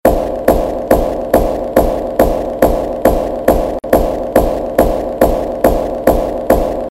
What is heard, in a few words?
panic; sprunki horror 1; horrorsprunkisilver